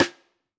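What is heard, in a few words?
Pearl Piccolo Side Snare On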